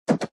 spin Button Click; mega joker

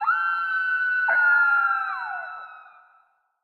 howl; the wolfs night

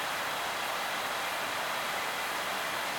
reel spin; joker pro

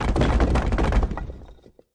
first block; pyramid

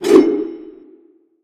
star jump; goldentavern

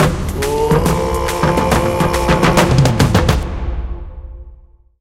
big Win Start; gonzos gold

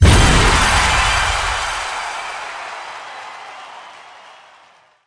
bonus hit; football

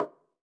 hit Soft, roulette; advanced-roulette

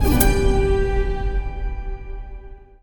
small win; gods of gold

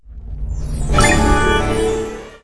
Win Reveal Low; candy; fairyhansel

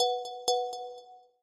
big Win Count Up End; elements

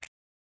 button Out; hotline

